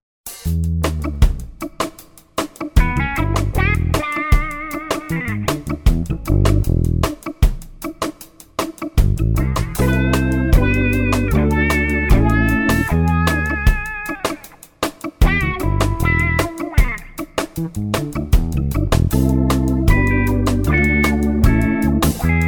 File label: Skanky Groove; Sample Library Tracks